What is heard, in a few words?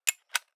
Metallic Gear